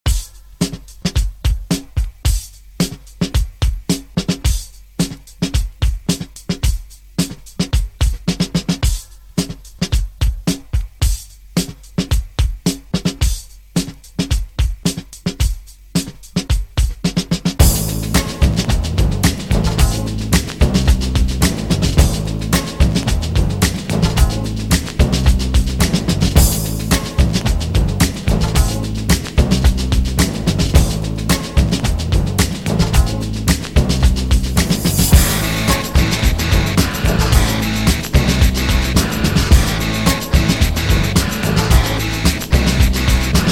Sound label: Funk 80s Redrum 109Bpm